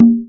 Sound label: conga open; Sonic Foundry (Sony Creative Software); Ugly Remnants VOL-2; Beatstuff